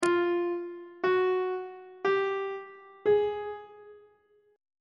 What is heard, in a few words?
f 2nd interval pitches; on treble clef